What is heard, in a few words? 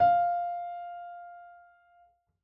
piano f; Golden Crayon Sounds